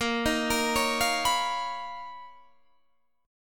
B Major Sharp 11th A sharp

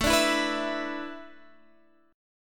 A Suspended 2nd Suspended 4th, Guitar, Standard; Asus2sus4; strummed; x,12,12,9,12,12; JGuitar

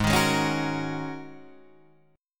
G sharp 9th Suspended 4th, Guitar, Open D; down; chord shape

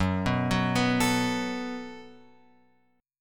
F sharp Minor Double Flat 5th F sharp; F#mbb5 Chord {2 2 4 4 X 5} arpeggiated

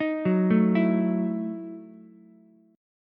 E Major 7th Suspended 2nd Suspended 4th, Ukulele; EM7sus2sus4 arpeggiated

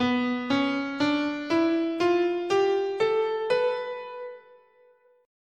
B Locrian sharp2, scale

